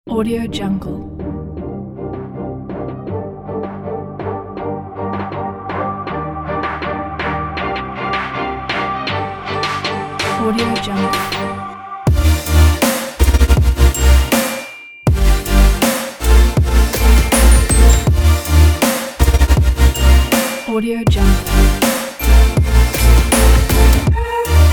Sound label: future bass